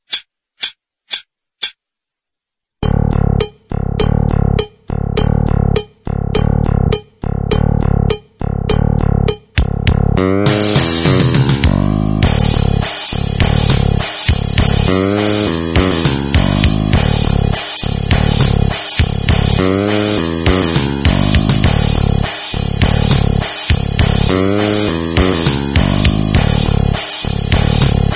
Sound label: backing 102